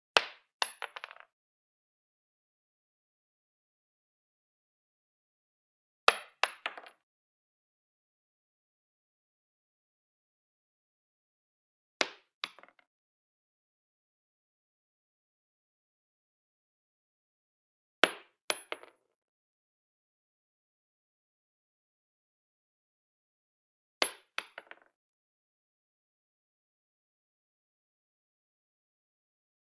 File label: 9mm Shell Drop On Wood; Hollywood Edge; Heavily Armed